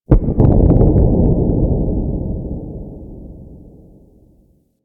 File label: Fortress Distant Asteroid Impact; Warframe; Grineer Fortress